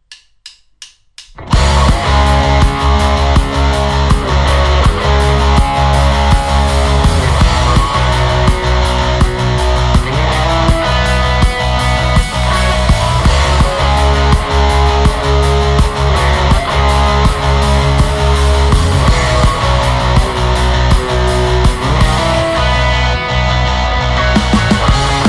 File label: Indie Music Black Magic Ritual